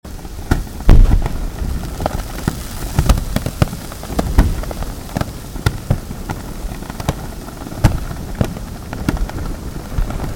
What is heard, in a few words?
Calving